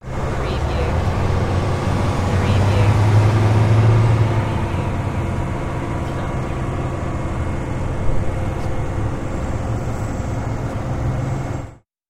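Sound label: Bus Door Off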